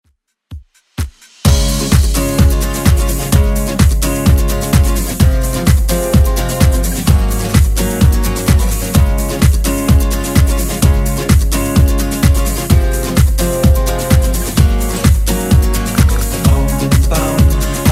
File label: 2Ks Redrum 128bpm